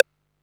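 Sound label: MISC Left, Techno 138 BPM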